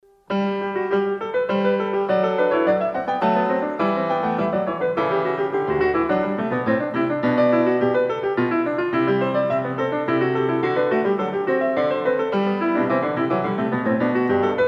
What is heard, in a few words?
Bach Goldberg Variations